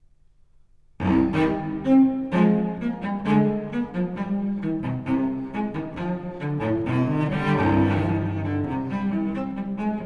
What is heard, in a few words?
Gavotte, Suite No. 5 in C minor; BWV1011